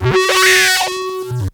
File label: Synth Shots; Vengeance Samples